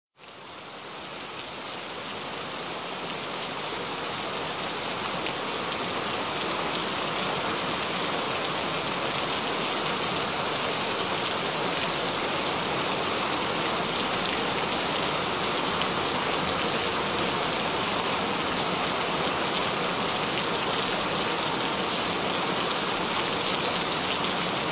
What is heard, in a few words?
Flusher Blockage Removal, Gentle Rain Sounds